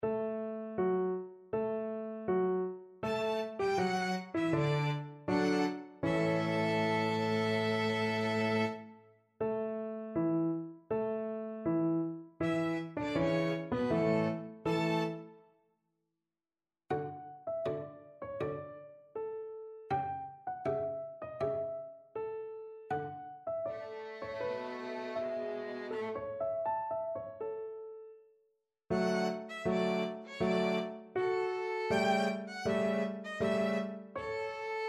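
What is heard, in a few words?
violin cello piano; Paganini; Witches Dance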